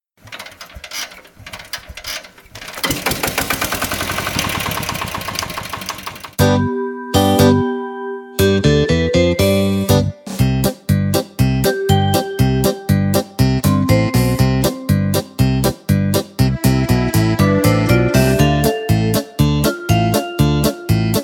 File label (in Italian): MOTOCOLTIVATORE base, polka